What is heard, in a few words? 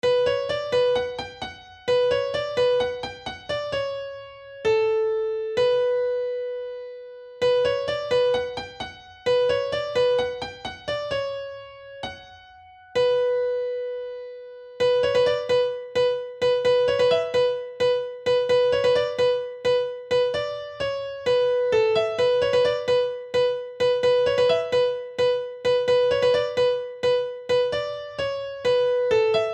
piano